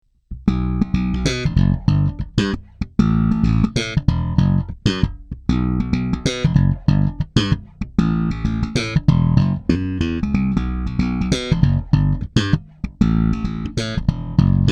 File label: Harley Benton BZ 5000 Slap active